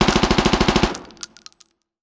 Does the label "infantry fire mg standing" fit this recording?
Yes